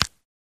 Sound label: Grapefruit Rind Snap; gore stabs & eat